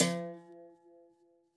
Instrument

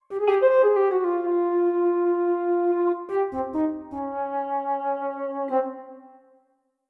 flute; undead overlord linux macos windows gamerip 2014

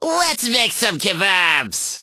Demon Tridents Taunt

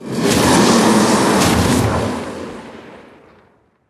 Demon Death; Level16

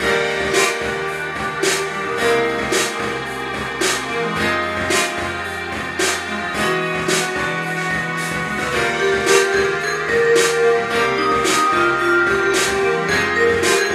Mall Muzak; postal 2 windows gamerip 2003

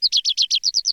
woodlark; CS Level2

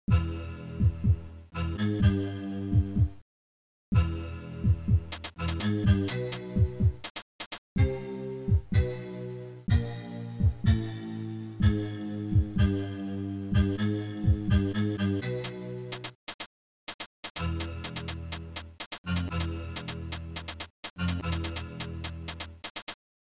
Atmos

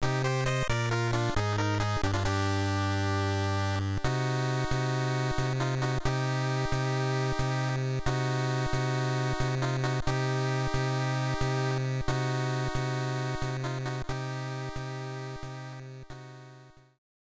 Lose; Medarot

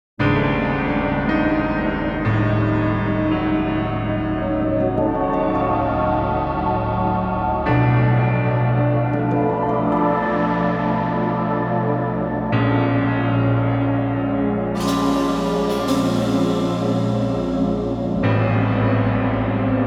Shadowy Footsteps; atri my dear moments original soundtrack 2020

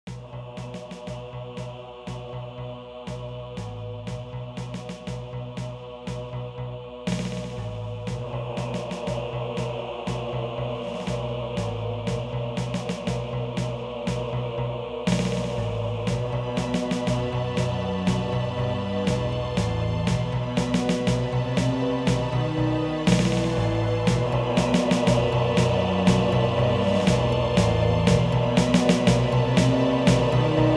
Victory, midi; Hexen

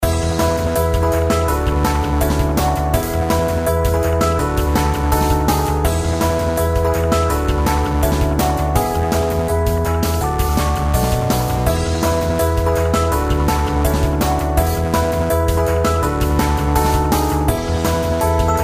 Bgm Main; Hyper Drone X

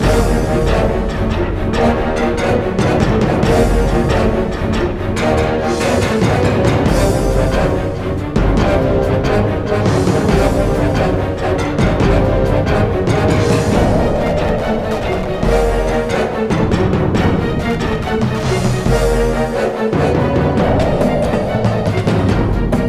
Action Loop; Chrome

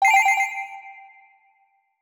Collect Pearl; Donkey Kong 64

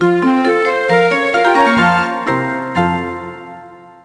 SFX Success; Futari wa Precure Splash Star Panpaka Game de Zekkouchou

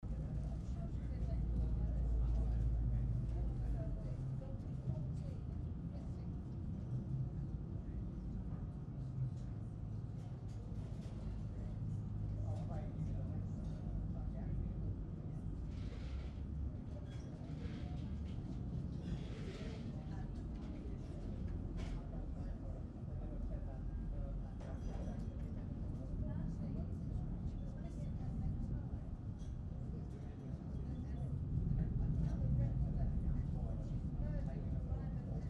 Diner Ambience

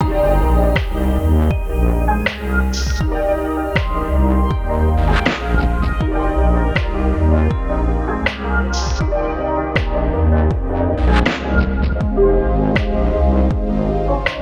bg track - C - 80bpm

